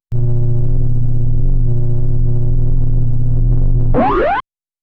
sci-fi railgun recharging, humming

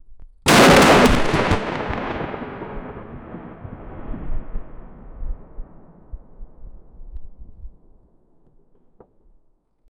crack

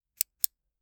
pen click; office

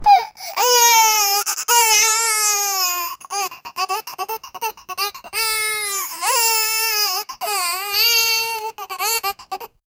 little boy is crying, whimpering

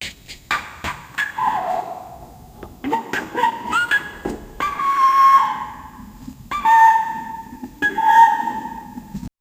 cute elephant sound

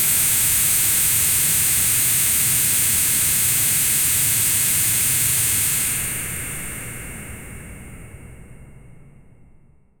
boiling over steam burst; explosive

